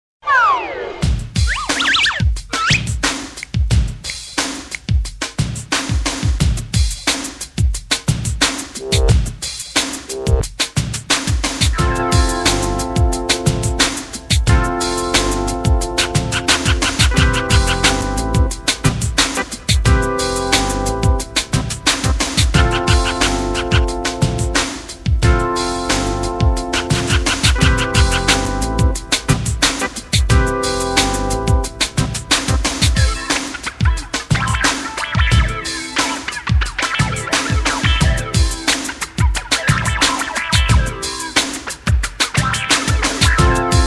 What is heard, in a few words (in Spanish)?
Rap de la Letra H (Instrumental); Abrapalabra La Magia de Aprender a Leer